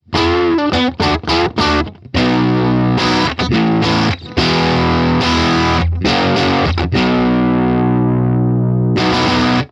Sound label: Stratocaster bridge and middle dirty